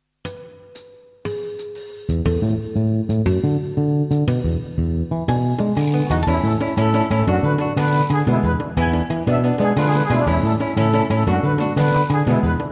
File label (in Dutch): fusion, Sneeuwsfeer met Pasen, ontspannen en opwekkend; marimba, fluit en viool; AG fusion